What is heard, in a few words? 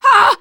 hurt major, teen girl; player; survivor; voice